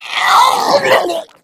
death, infected; npc; Left 4 Dead 2; Source Engine

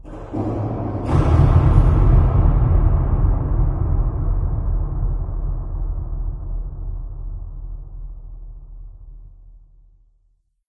amb rock fall, ambient organic; video games; source engine; portal2

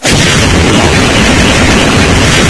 rocket, weapons; source engine; hl1

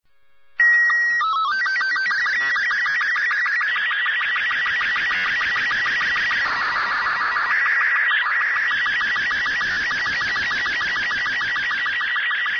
ambient; Portal 2; Source Engine; video games

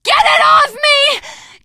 scream while pounced, teen girl, survivor voice; source engine; left 4 dead 2

